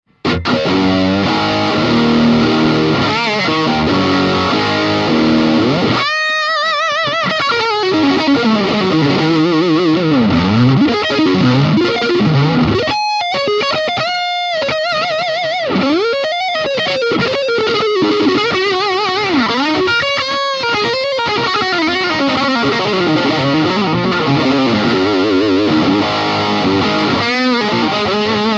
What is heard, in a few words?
heavy